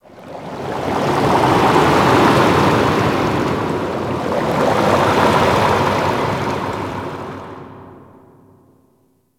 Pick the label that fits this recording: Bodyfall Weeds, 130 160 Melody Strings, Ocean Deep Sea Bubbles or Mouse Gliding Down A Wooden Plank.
Ocean Deep Sea Bubbles